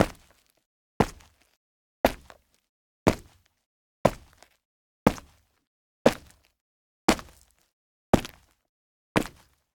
Foley Footsteps Rocks Sneaker Jump Land On Two Feet Close; Tovusound; Edward – Foleyart Collection Add-On Extended Footsteps; GDC 2017; Game Audio Bundle